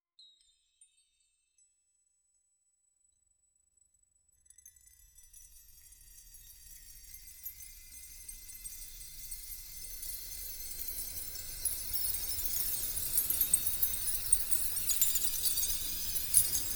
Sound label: ember sparkle win chime; volcanic